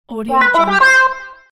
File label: level up; albasfx